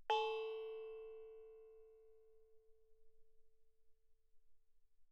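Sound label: thai gong A4 mezzo forte, Percussion gongs & tamtams; thaigongs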